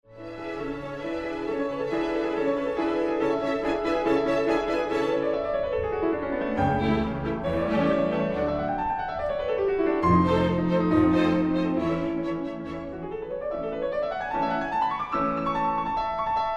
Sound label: Sample Classical Before